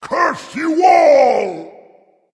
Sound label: Death Dragon; Fafnir